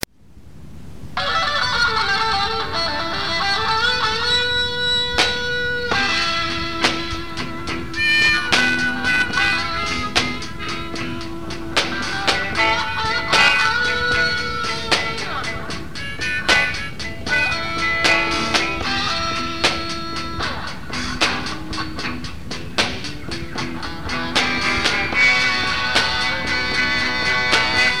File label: blues